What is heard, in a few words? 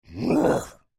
Creature Grunt Short, Human; Voice; Big Room Sound